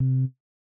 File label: Rhodes; Super Mario Bros. Remastered